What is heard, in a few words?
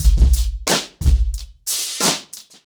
90BPM, acoustic drums; The Roots Drumloops